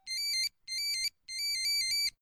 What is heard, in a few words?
motorola startac ring style ringtone